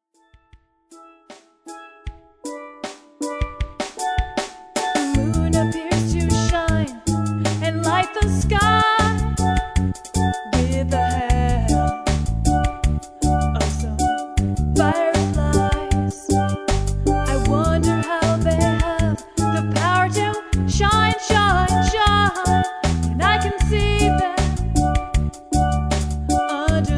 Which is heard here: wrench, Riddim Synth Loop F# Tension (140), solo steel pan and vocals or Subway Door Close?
solo steel pan and vocals